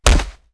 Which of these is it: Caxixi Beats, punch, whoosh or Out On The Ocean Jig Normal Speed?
punch